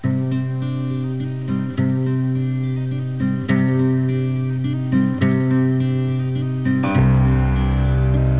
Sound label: chimes